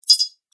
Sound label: result gold mini game; NuDiepVien